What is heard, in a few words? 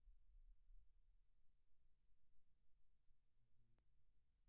Kick In, 96bpm